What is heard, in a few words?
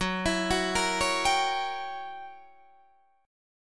Gb 7th Flat 9th Gb Guitar Open D